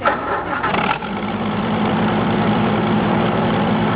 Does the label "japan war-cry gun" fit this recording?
No